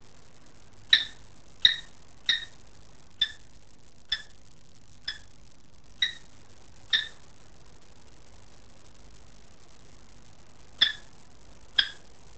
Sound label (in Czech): postolka